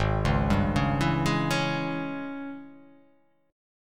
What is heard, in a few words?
G sharp Major 7th Suspended 4th Sharp 5th G sharp, down